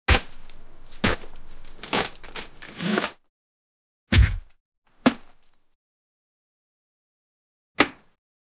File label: feather drop fluff fluff; flop